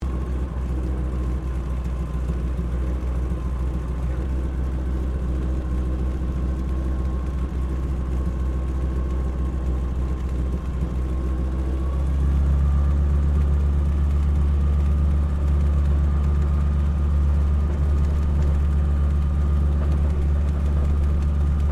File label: Aircraft AT 6D Harvard Mk III idle taxi interior, American military training aircraft; Airplanes